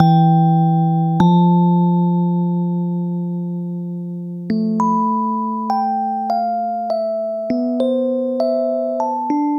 E Piano, hip-hop

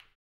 HAMBONE, Vocal Blues Soul; Spectrasonics; Planet

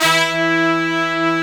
Brass E3; Brass98

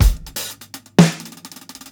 125 HI HAT ROLL, DRUMS LOOPS; BASEMENT DRUMS VOL 2

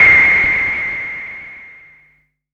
EFX, SFX KIT; Wizoo - Platinum 24 Electronic

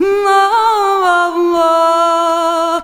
VOICES; USB Soundscan vol.63; AKAI

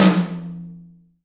TOM TOM, Roland

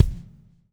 SLICK, Backbeat

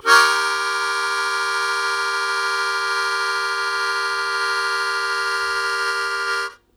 Blues Chord Attack Eb1, Harmonica; 90 s Sample CDs; Zero G

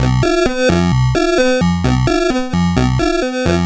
Sh101 Loop, 130BPM

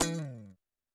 percussion, Roland TD-20

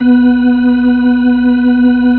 B-3

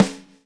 GRV, Groove; AMG Gota Yashiki Groove Activator

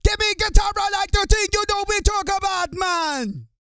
REGGAE, Vocal Planet, World Voices; ILIO; Vocal Planet VOL-5

